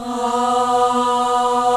MIXED CHORUS

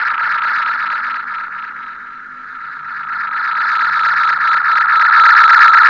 ALARM; Best Service; XXL Production Effects; ALARMS; Sample CDs

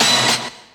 D2 STAB; Zero G; Man Machine

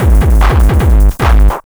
MD 909 150, Hard & Loud Techno; USB Soundscan vol.01; AKAI

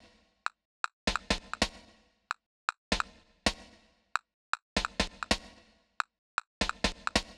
Rm-Bl Loop 130, Perc Loops 130, Loungin House; Equipped Music

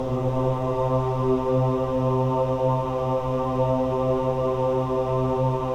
Choir of Ahs; Roland - LA Composer vol. 1

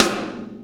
Snare Shard

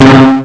Blub; ST-XX Amiga Soundtracker Sample Packs

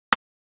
RIM; CMP - Analogon; THE PUZZLE

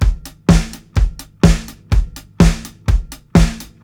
125 4 FLOOR, DRUMS LOOPS; BASEMENT DRUMS VOL 2

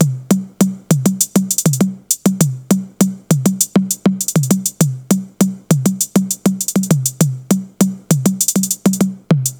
100 Beat; Zero-G; Future Wave; Beats

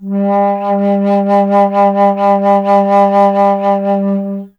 Alto Flute, Solo Instruments

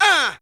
CR80 FX4, house; 90 sSampleCDs; Crypton - DrumBase5000

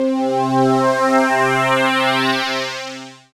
SYN VCF, SYN Analog; Sound & Vision - Gigapack I CD 2 (Roland)

